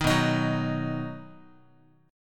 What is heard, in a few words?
B Major, Guitar, 4A,4E,5A,5D,5G sharp,6C sharp; strummed; 6,7,6,4,x,x; JGuitar